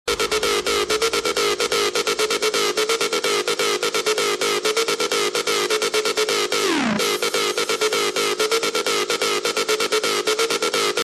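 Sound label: electric disco ringtone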